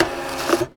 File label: printer; Golden Crayon Sounds